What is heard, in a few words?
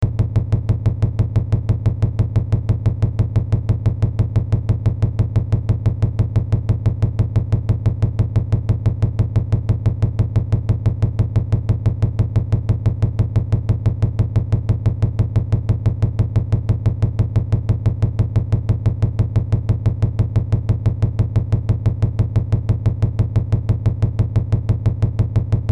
Drum Fast